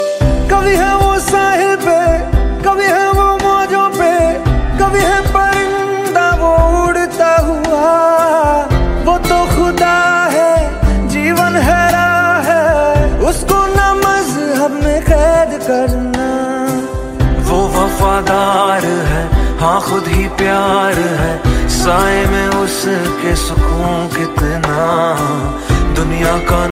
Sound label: christian gospel